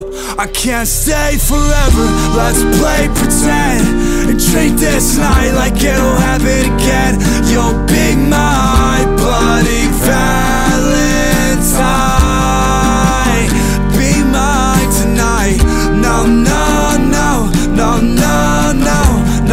Acoustic

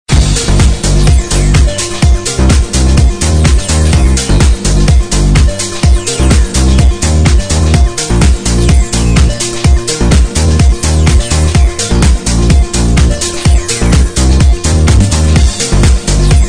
pop 80s

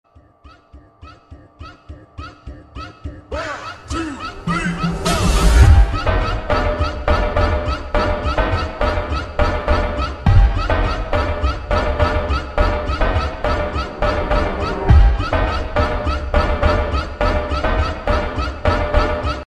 Phonk Ringtone

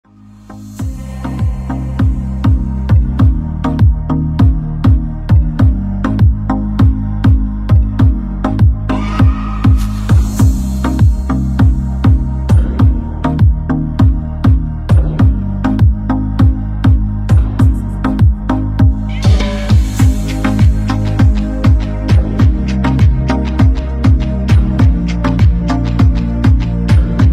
Instrumental

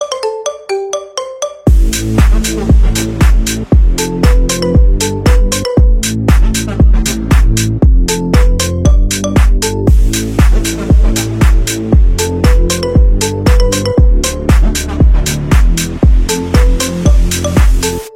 Marimba Remix

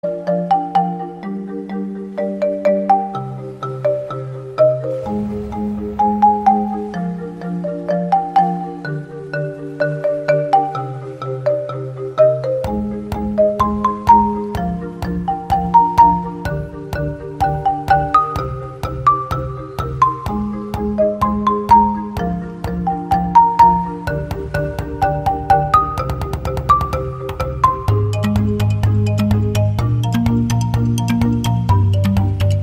Marimba

